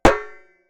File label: Footsteps on metal